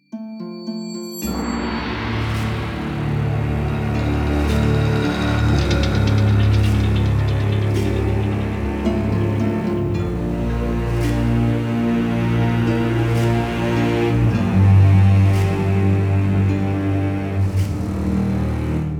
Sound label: Monster Hunting; Skellige